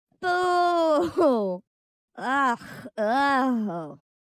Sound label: Woman Booing; Zomboid; Lifestyle